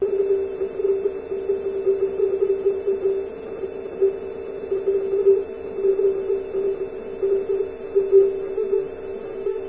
tech amb, ambience; Source Engine; hl1

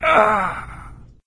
death, player

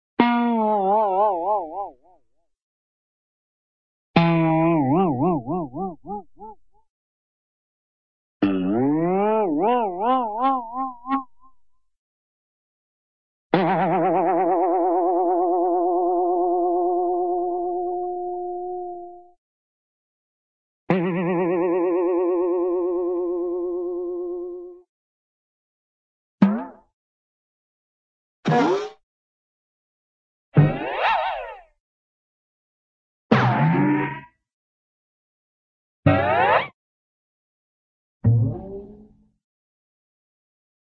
boing, cartoon bowang

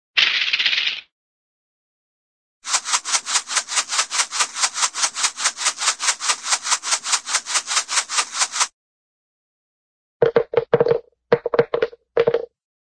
shake, cartoon short rattly shake

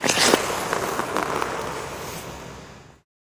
taunt skating scorcher stride; video games; source engine; tf

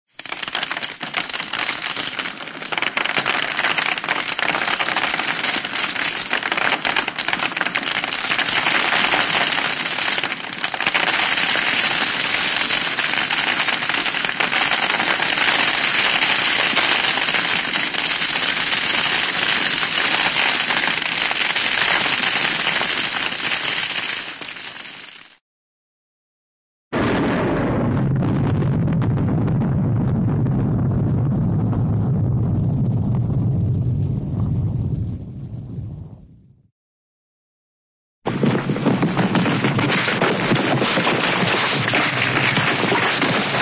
hail, storm hail storm, weather; cartoon

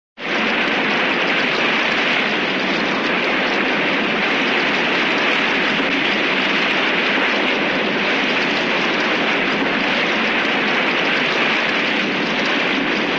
water, river rushing river; cartoon

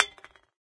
metal pop can impact hard; physics; Source Engine; cstrike

